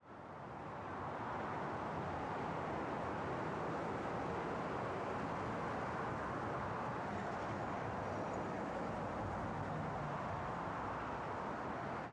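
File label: Ayers Traffic right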